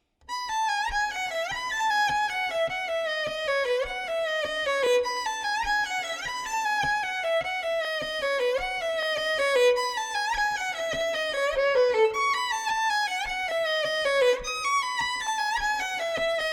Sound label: kreutzer violin studies etude no 11